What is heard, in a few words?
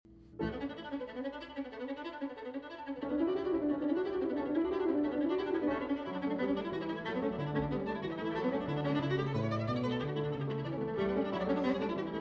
violin and piano; Saint-Saens Sonata No.1 For Violin and Piano in D minor, Op.75; 4. Allegro molto